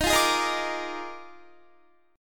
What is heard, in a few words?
Eb Major 7th Suspended 2nd Suspended 4th Eb Guitar 4F 4A 5C 5G 5C; EbM7sus2sus4; strummed